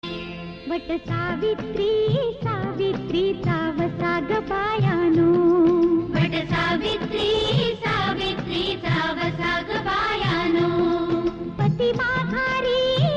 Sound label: Marathi Song Ringtone